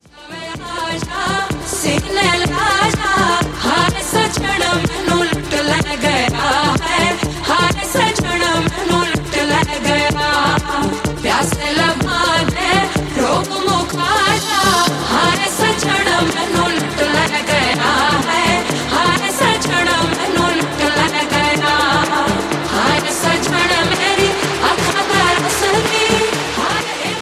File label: song ringtone